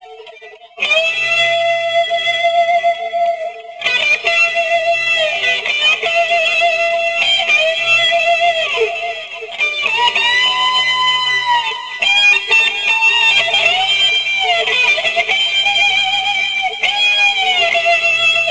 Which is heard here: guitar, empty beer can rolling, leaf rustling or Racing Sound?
guitar